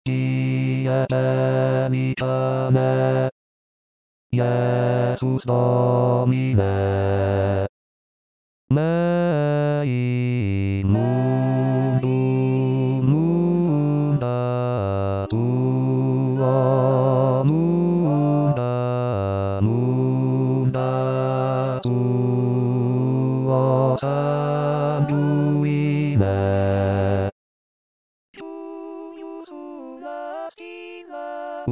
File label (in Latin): pie pelicane basse